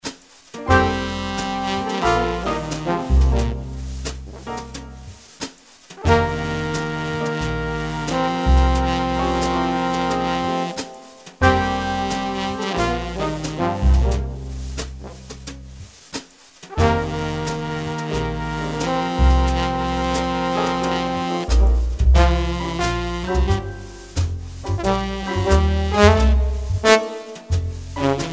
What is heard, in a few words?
brass ensemble